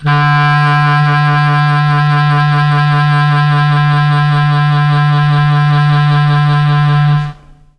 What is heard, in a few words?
D2 clarinet, mellotron; CHM CLARINET